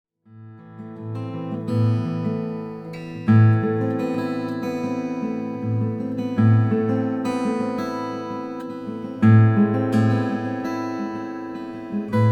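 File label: Moonstone 000 42 Brazilian rosewood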